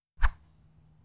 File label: Light Whoosh Sound